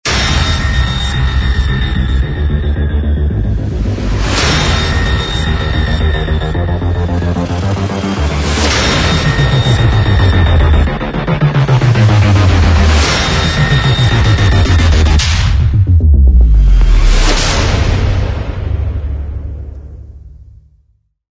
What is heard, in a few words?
Glitchy Trailer Mid Section; 音乐素材 故障预告片 Glitchy Trailer